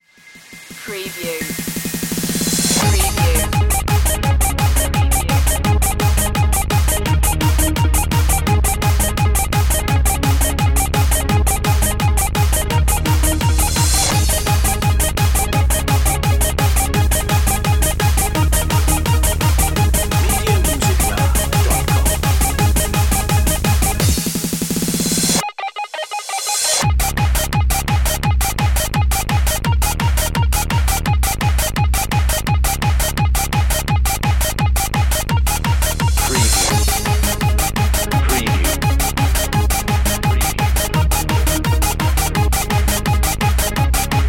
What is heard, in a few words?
bubble gum beats